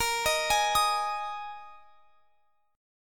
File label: Bb 7th Suspended 4th Bb; arpeggiated